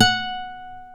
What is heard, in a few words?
F#4 FLAMENCO, GTR; AKAI S6000; WorldSounds